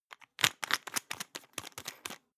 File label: toothpaste screw cup open fast hard